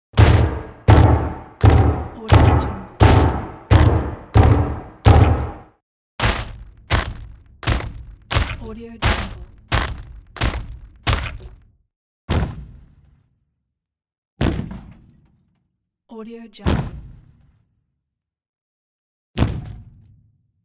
monster footsteps

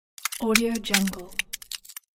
ice crackling

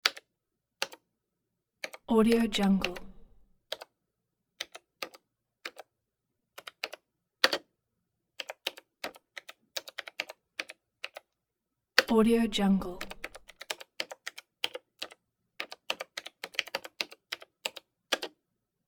pc keyboard slow typing; hollywoodedge

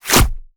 gore head hack dry; soundmorph

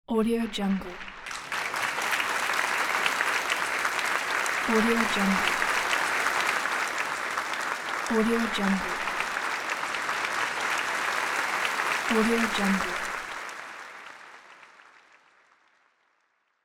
applause

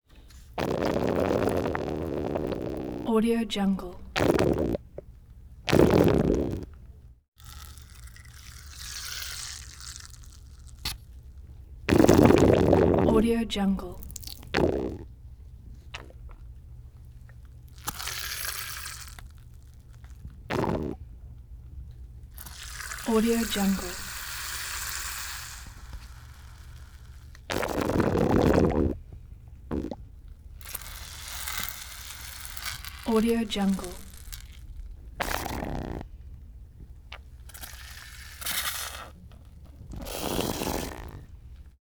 squeeze bottle filled with water sucking; prosoundfx

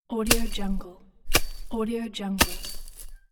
cut weeds

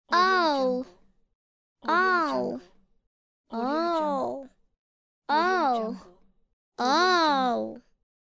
little girl voice oooh

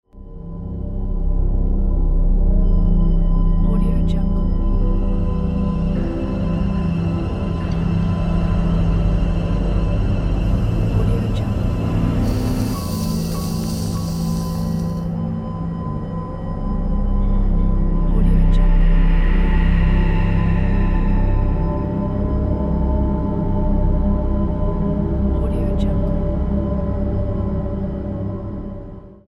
cinematic dark ambient logo

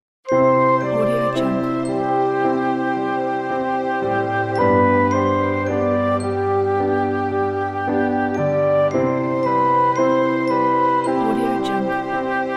peaceful calm

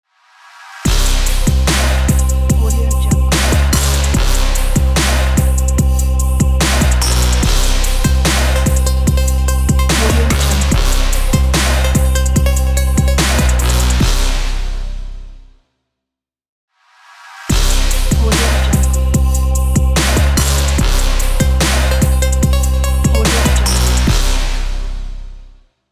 trap powerful logo

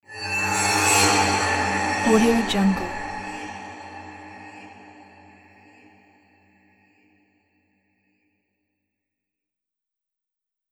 danger approaching; mountainaudio

